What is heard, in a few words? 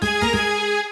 Tadum